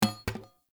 Hunter Bow Drop Arrow; Weapons; Enemy; Bows

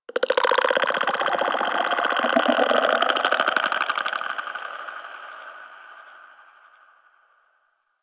Infested Devourer Vocal; Warframe

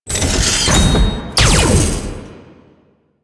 Sword Finisher; Warframe; Quests; The New War; Teshin

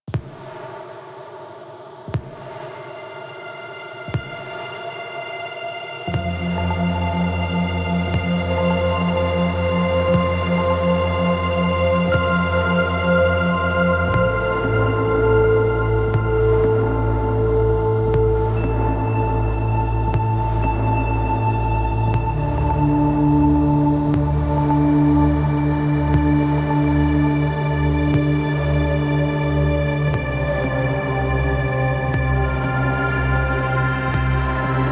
BG Music; Warframe; DE; Night Wave Radio; Nora Night Episodes

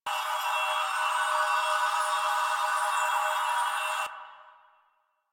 Courier Trinket Loop; Warframe; Duviri; Courier Delivery

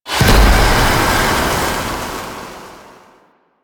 Void Dragon Firebreath Cast Poison; Enemies; Abilities

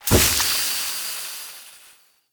Root Cave Magic Spore Puff; Ambience; Props; Neathuns Root Cave

